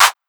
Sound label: Clap Crispy; pitchglitch